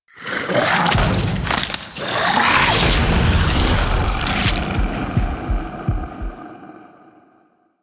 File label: Chair Injector Infusion; Warframe; Hub; Liset Alchemy Lab